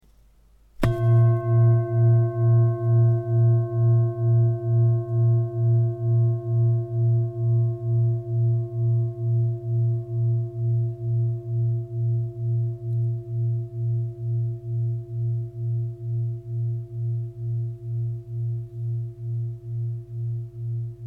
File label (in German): Klangschale; 2164g